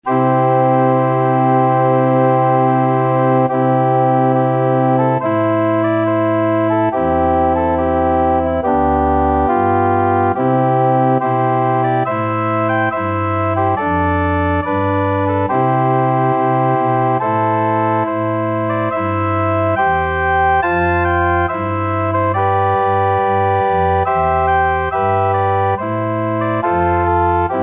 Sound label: ORG, organ